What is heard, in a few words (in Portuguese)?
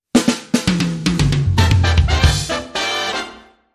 Banda Ao Vivo